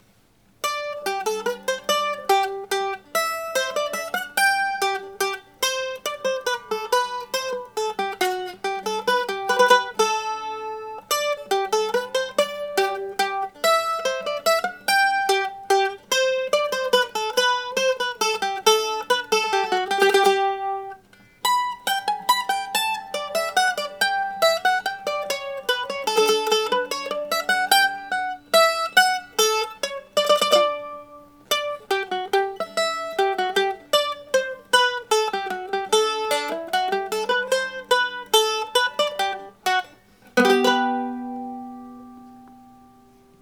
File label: bach minuet in g, mando; rex bowlback